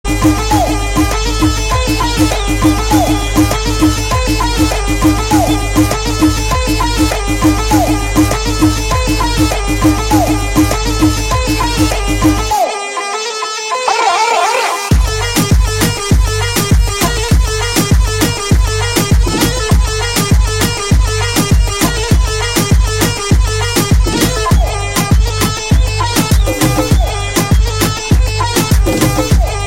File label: Ringtone